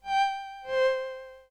drop; Mission Python

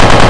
machine gun; Game Development with Blender and Godot

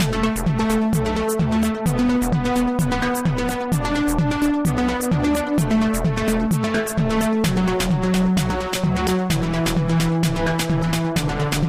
steel music; Mission Python

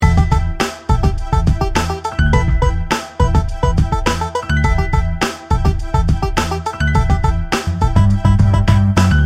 loop

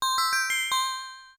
combine; Mission Python

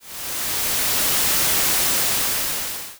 take off; Mission Python

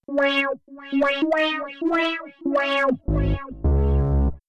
gameover; Mission Python

